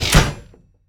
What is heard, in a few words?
jetpack close; smite; Anhur